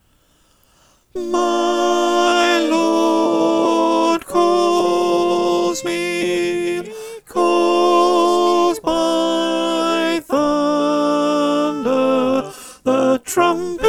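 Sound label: Alto, choir; Steal Away v1&2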